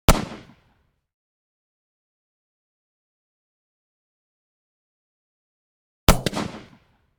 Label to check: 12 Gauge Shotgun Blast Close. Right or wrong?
Right